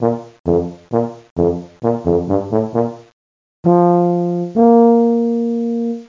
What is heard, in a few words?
Tuba; kentei kyoukai imasara hito ni wa kikenai otona no joushiki ryoku training ds